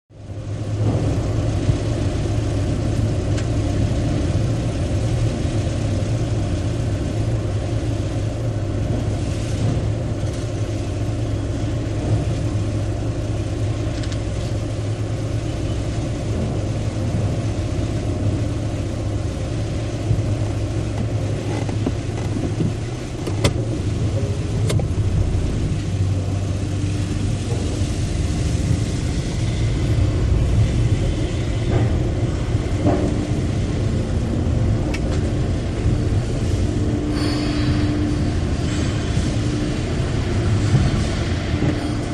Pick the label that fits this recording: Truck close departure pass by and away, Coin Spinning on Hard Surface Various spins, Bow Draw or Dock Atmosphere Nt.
Dock Atmosphere Nt